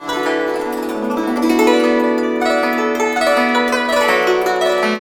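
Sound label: guzheng; Spectrasonics; Heart of Asia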